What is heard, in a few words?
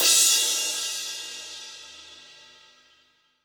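cymbal crash; K-Crash st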